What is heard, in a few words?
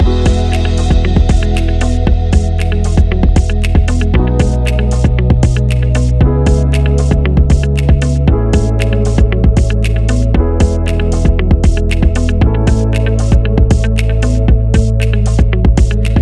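Marketing Loop, Corporate background music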